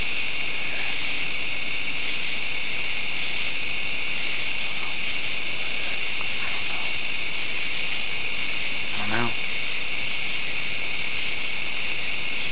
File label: Electrical noise heard in cemetary